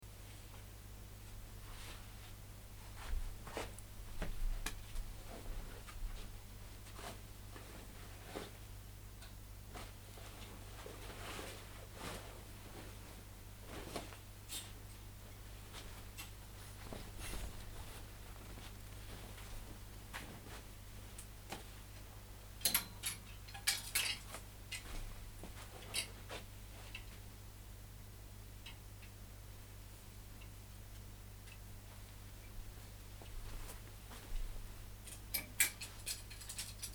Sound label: hanging laundry